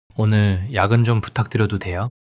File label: struggle